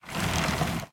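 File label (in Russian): громкий короткий ясный шум прибоя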